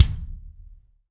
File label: BD AMBIENT, Real Mega Drums; Best Service